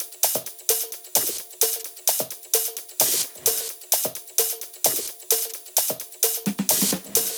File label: 130BPM Kit; VFH1 130BPM Remnant Kit